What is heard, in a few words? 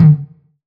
909 Tom amp; Goldbaby Tape808 & Tape909; The Tape 909